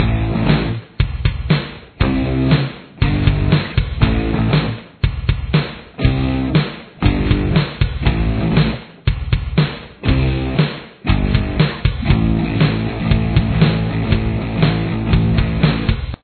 chorus, guitar

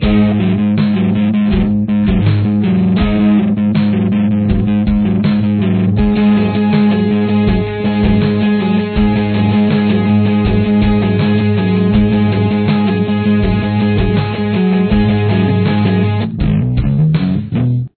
correct full, guitar

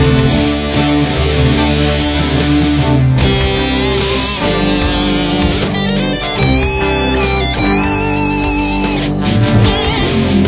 solo mix

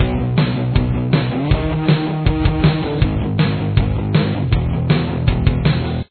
chorus guitar

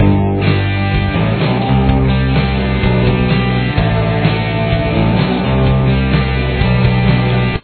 chorus, guitar

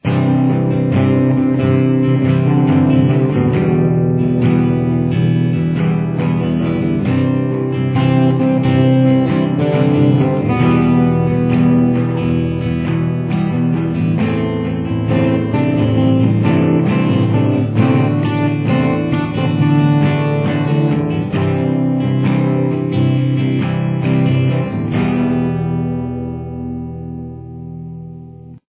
guitar

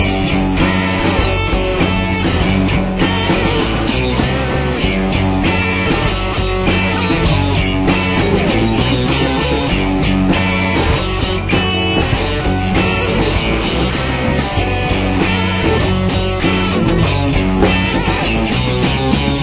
verse main

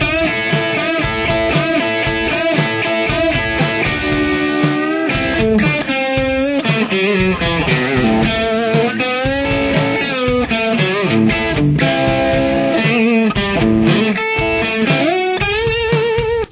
solo guitar

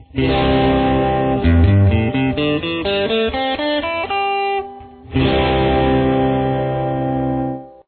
c Style open, guitar

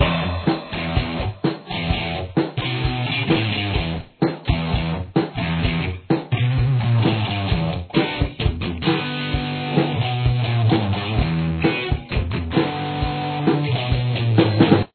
intro rhythm, guitar; Main Theme

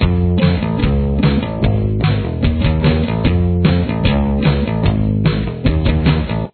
bass

